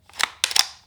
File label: Chamber round